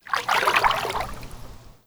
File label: Water Lapping Gentle Small; Ambience; Warframe